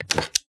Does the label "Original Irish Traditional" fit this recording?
No